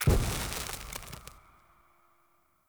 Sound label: Soul Mode Hand Flame Loop Start; Powers; Fenn